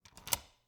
Cabin, front door, deadbolt, lock, latch, thick, close, hyper; Eiravaein Works; Vaeyan IV